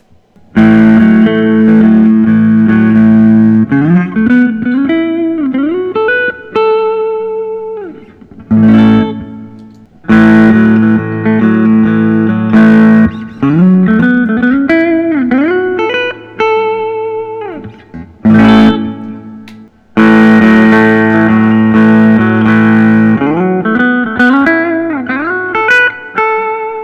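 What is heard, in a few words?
collings i 35 spruce top with iced tea sunburst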